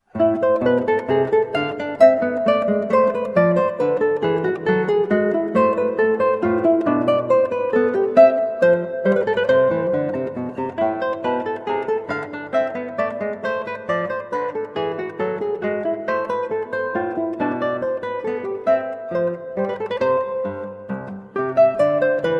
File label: Menuet, Guitar; Menuet from French Suite 2 BWV813; Johann Sebastian Bach